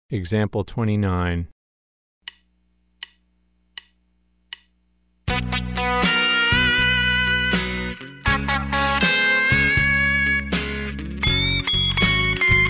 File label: Country Rock